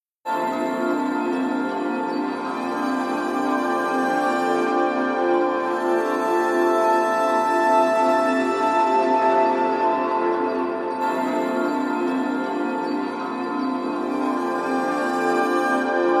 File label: AMB Guitar